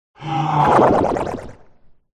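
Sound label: crystal golem attack voice bottom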